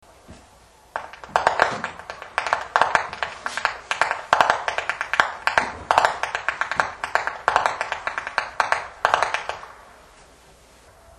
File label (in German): schnalzen, flachgau; steindorf